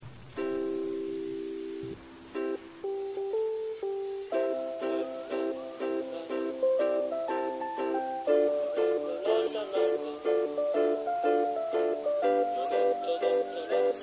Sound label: taiko